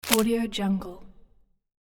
wood impact reverse; hollywoodedge

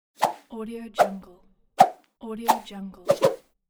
swish short fast wooden; bestsfx

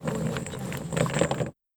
large rusty chain drag

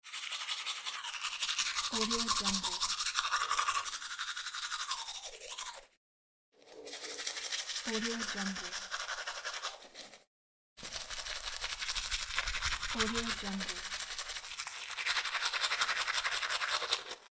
quick teeth brushing

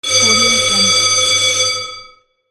military signal

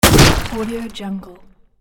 ground impact

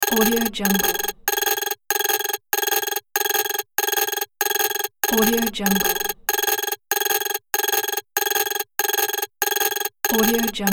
machine data process

